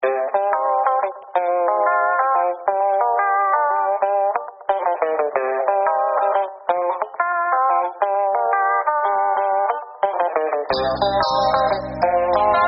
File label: music